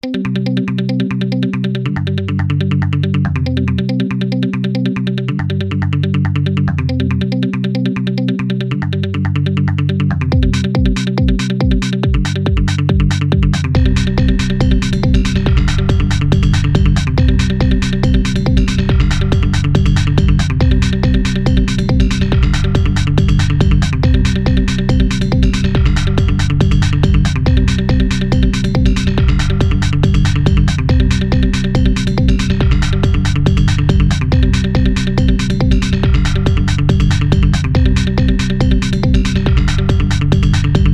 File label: Bass fields